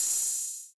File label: openhat 808